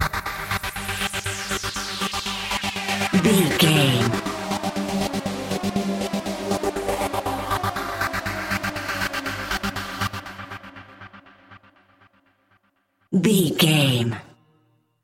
dark dance breakdown, Dance, EDM, Club, Disco, Eurodance